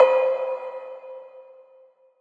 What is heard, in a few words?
drop oneshot bell C